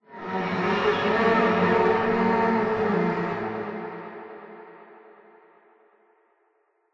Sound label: Npc Combat Strings; The Sinking City